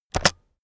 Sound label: keyboard external space press fast heavy